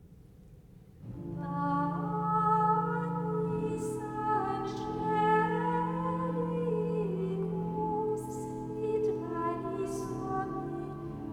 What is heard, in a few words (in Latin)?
panis angelicus, gregorian chant